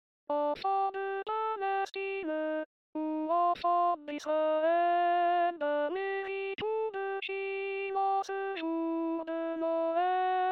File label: alto